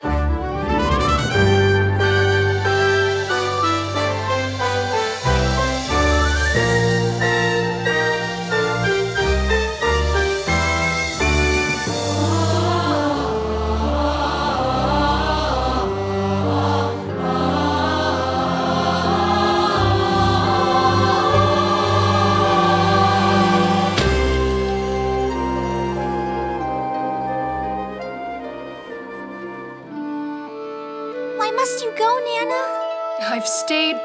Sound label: Opening Fanfare and Opening Scene; anastasia the musical